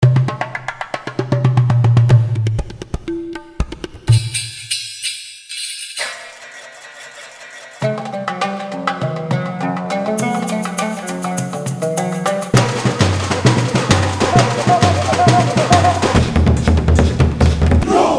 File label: Roland JV; roland-cartessons